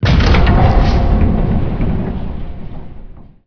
open; mcv; Emperor - Battle for Dune

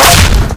melee cricket bat; weapons; Left 4 Dead 2

